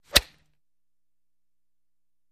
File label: Golf Tee Off